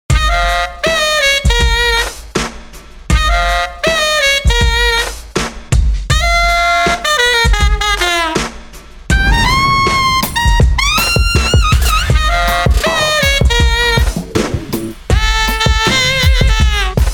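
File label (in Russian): саксофон весело варьете шоу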